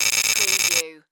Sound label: Data Modulated Readout